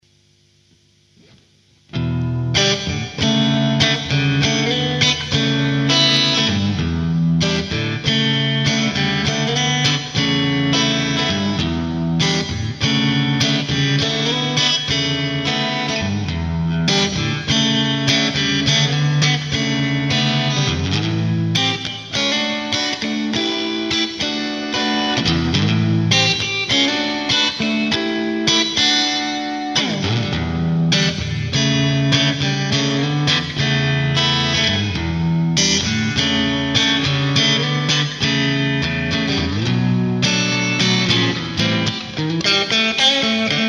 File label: Mitte Marsh Stalone, vp strat56